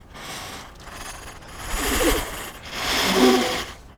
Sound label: Sinister Breath; Total Drum&Bass